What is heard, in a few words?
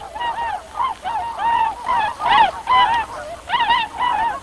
tundra flightcall